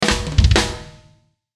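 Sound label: Musical Element, Drum Snare March; Blastwave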